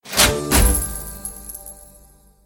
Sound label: add credit; keezers